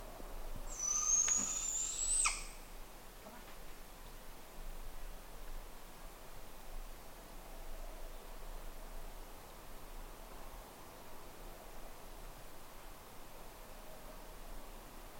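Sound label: barred owl, Forest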